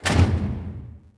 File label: door sliding metal clunk; doors elevators; Star Wars Obi-Wan; XBOX